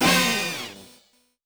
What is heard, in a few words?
game over; android